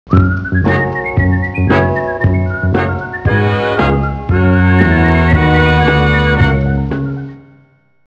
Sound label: Commercial Break Cue